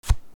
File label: card play